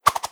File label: Masked Spirit Attack